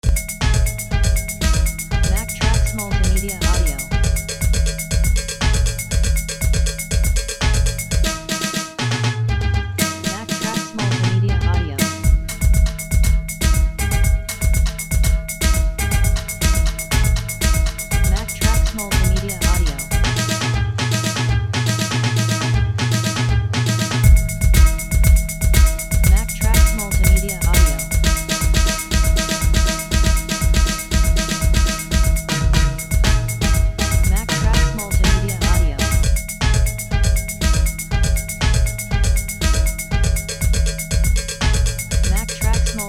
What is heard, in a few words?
latin drums speech